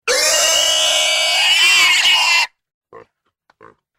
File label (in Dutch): Zwijn luid kort